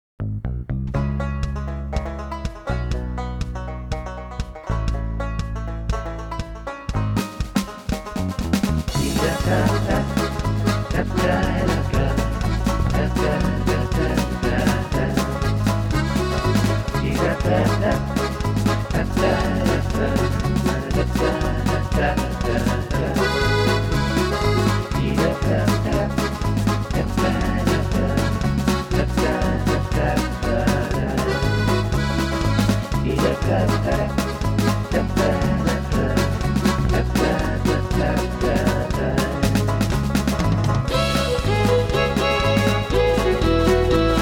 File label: Bluegrass Shanty